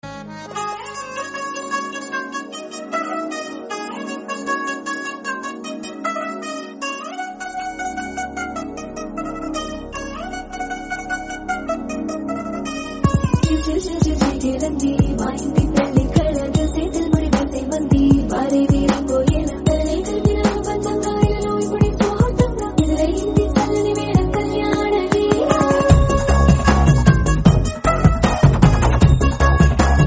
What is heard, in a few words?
marriage music